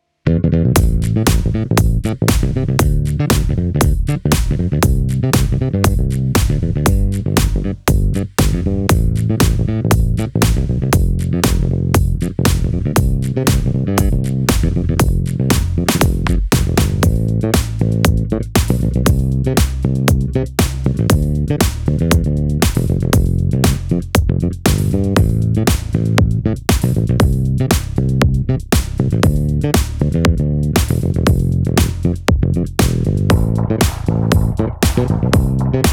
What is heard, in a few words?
Pop; video games